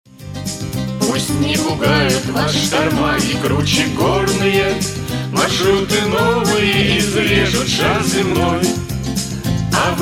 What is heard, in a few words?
Mix 4 voice tuned